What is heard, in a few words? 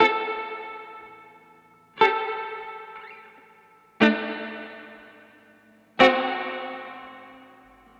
Guitar, 70s Analog Funk; Sonic Foundry (Sony Creative Software); What it Is!